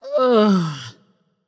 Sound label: nv ugh; smite; voicepack; morgan le fay